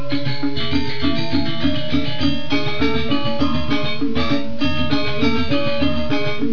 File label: gamelan; asia; indonesia